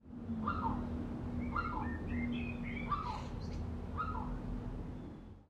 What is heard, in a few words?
bird